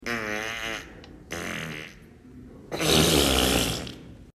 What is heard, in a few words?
fart